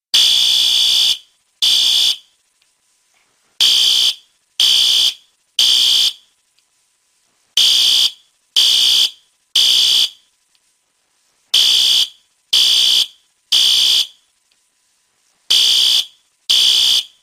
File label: System Sensor PC2WH Code-3